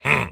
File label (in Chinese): 卫道士待机声